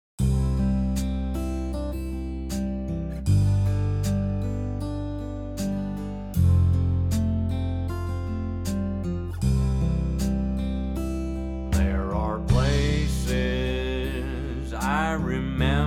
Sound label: country vocal